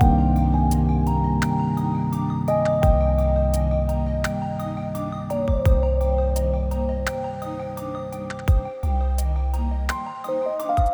Warm Breeze; BB 2HTC Samples Vol 4

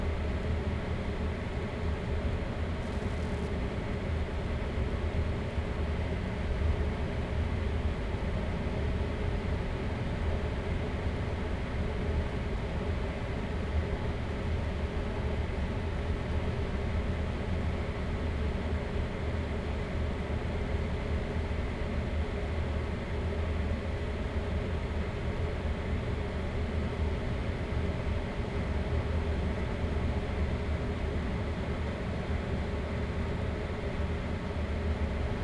Air Vent; Fans and Drones

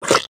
mud

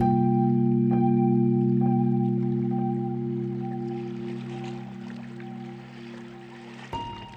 Mystery Pad; BB 2HTC Samples Vol 4; Pads

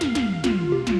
Toms Fill, Drums; BB 2HTC Samples Vol 4

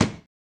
wood hit

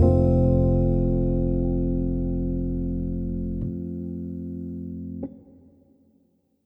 Lush Outro, Pads; BB 2HTC Samples Vol 4 Addendum

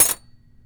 Silverware Ting; BB Retail Therapy Sample Pack

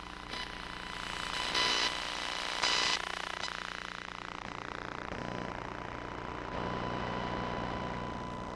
Cell Phone, electromagnetic sounds; Micro Pack; NazdyNate

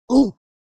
ooh, creature

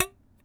Small Crushed Can - Flick Tab; Micro Pack

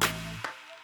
Snappy Clap, Drums; BB 2HTC Samples Vol 4

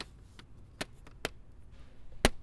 Plastic Case; BB Retail Therapy Sample Pack

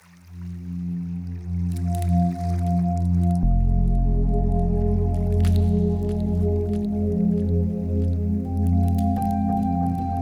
Drenched, Pads; BB 2HTC Samples Vol 4

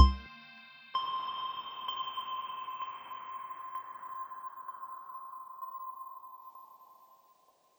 Resonant Bloop; BB 2HTC Samples Vol 4; Weird

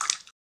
slime; water splash slime

